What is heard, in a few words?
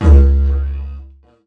Didgeridoo Kit Samples